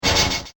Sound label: Robot Titan Impact; Robot Sound Effects; science fiction sound effect; Andrew Fleming; Twistedtracks